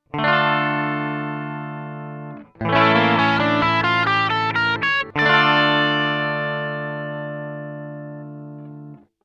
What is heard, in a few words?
harm phryg; fretjam